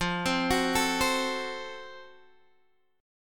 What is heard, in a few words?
Gb Minor Major 7th Flat 5th, chord